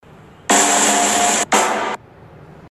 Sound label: drum roll